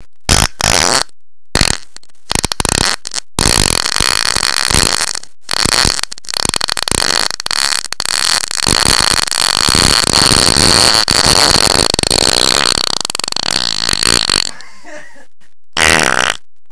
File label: a fart